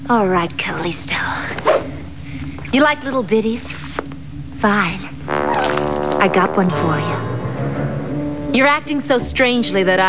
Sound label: ditty